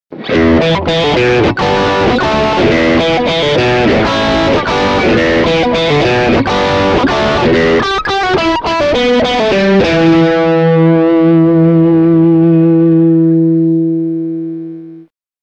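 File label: Squier Distortion, classic strat humbucker; Przester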